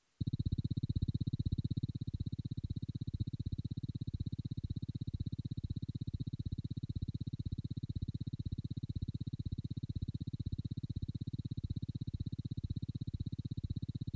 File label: 030bpm; elektron machinedrum